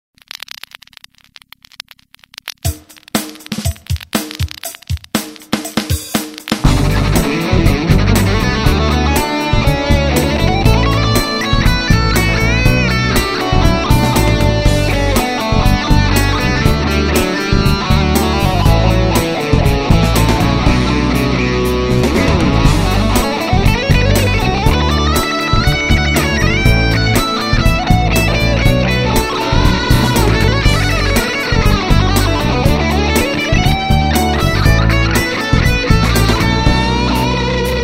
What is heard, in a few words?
Spacy Guitar